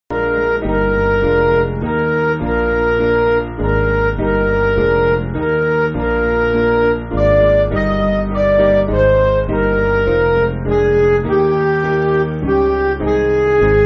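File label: Midi, Music Backing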